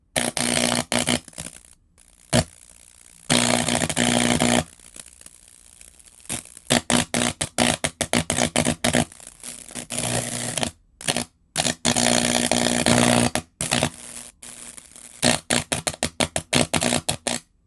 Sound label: water and electricity; fvtt sonniss gdc audio